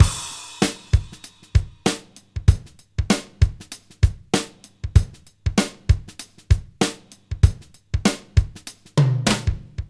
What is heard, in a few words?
ac drumloop dry